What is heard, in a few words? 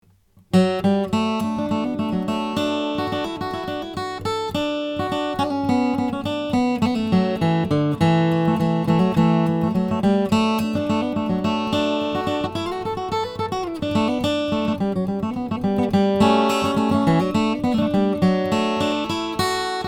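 blanchard tamarack brazilian rosewood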